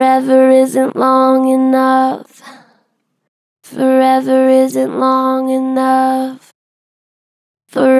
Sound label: Pitch Flat Gentle, Vocals; Forever isnt long enough; Harmor Vocals